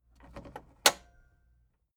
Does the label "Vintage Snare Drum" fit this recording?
No